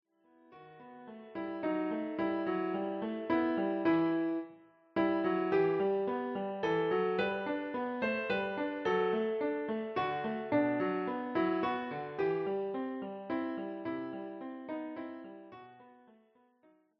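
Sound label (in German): Klavier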